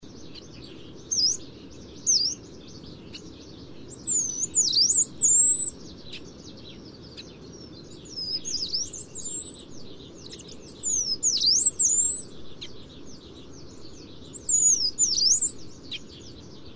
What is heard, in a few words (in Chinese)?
黃眉柳鶯; 柳鶯科/過境鳥、冬候鳥